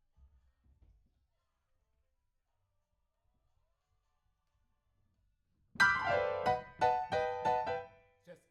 PIANO, RNR for piano

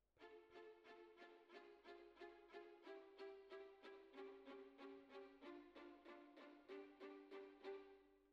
Cellos Over

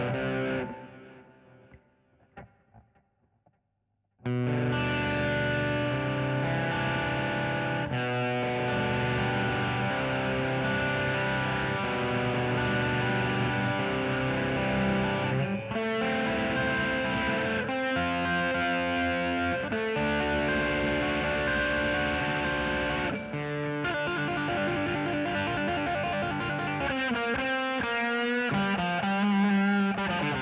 GTR B Solo

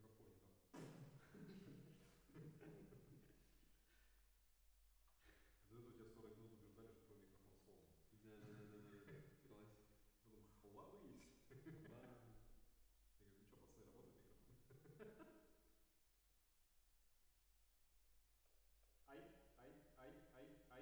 MKH AB, piano

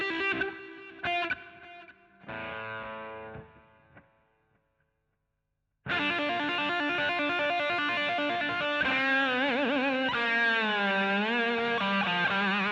GTR A Solo

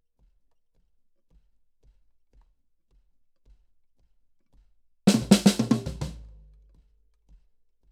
KIT

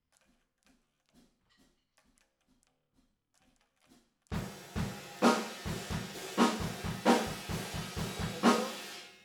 TB GTR

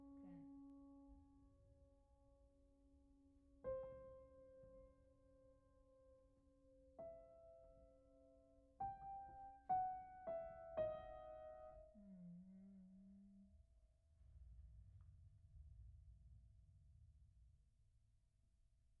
Piano Mono

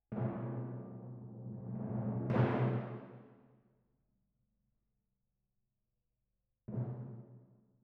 Timp

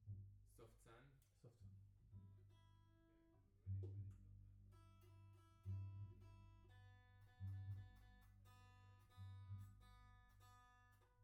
Piano B

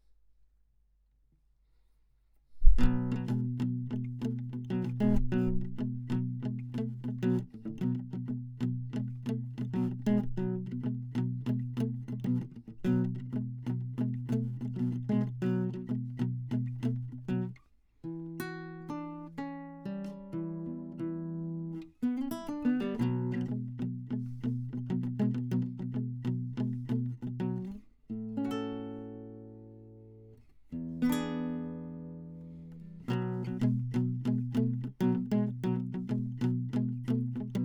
Classic Guitar

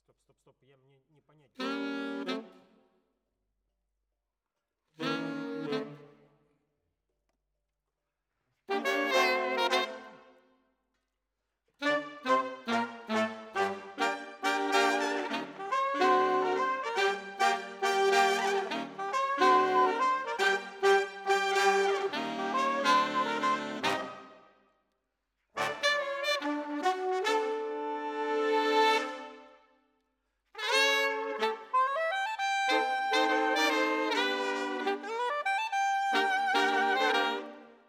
Sax

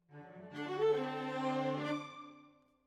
Violas, jazz for piano

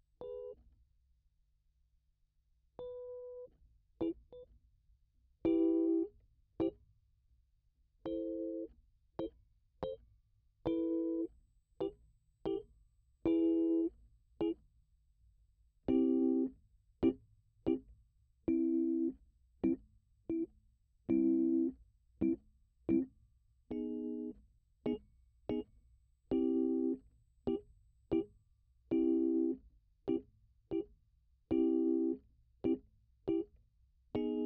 Rhodes

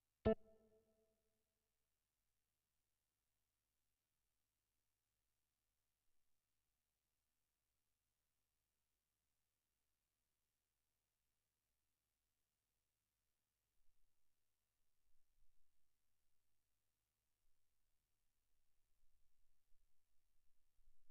Keys

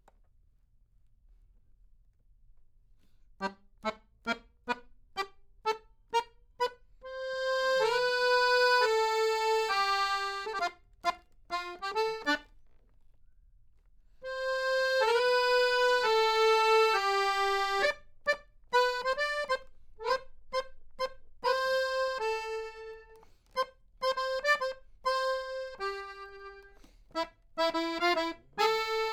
Accordion

